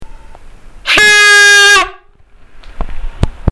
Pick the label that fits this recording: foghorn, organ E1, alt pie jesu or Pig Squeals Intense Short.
foghorn